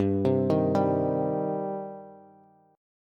G Suspended 4th Sharp 5th G, down, Bass; Gsus4#5 arpeggiated; JGuitar

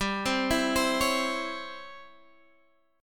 C sharp Minor Major 7th Flat 5th G, chord

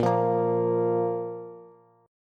A sharp 5th A sharp Bass

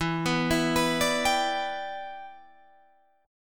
E Minor 7th, Guitar, Drop D

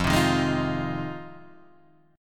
F Minor 7th F Guitar Standard; strummed; JGuitar

